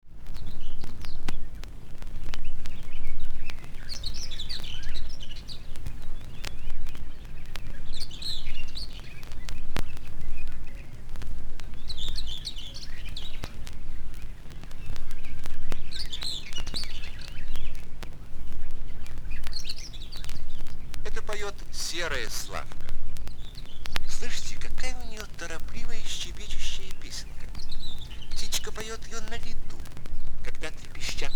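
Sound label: bird voices in nature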